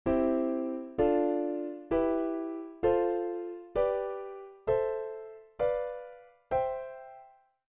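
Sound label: Triads Major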